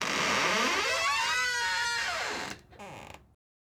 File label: Door Squeaks; household